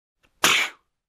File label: Male Smirk